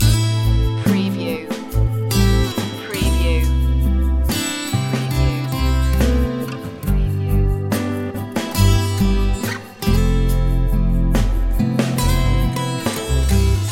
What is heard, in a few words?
mellow guitars loop